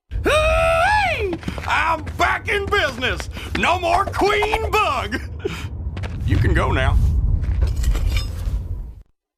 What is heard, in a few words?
Miner success (cinematic)